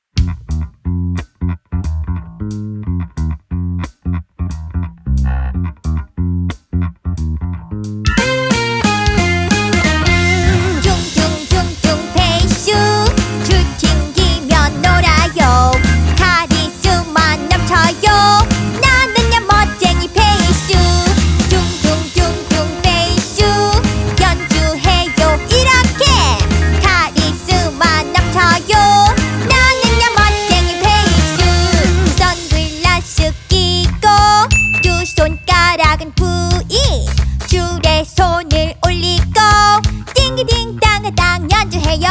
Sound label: Bass guitar song